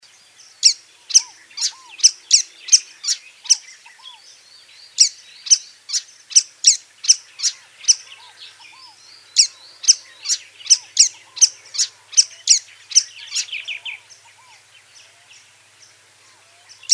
buffy hummingbird

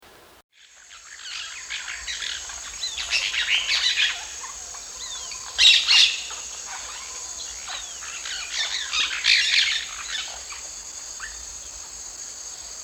tui parakeet

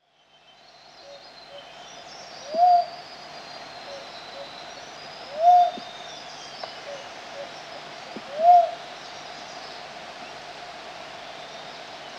philippine cuckoo dove

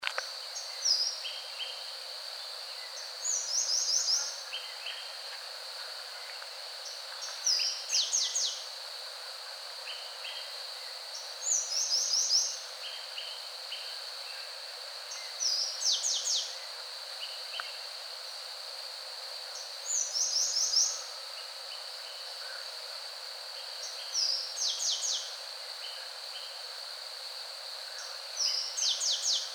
rufous capped brush finch

